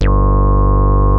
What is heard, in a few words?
HITEC G1, ANALOG BASS; Music Store - Claudius Diemeter Schwerlos